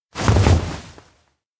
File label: fall; chiharu; anemoi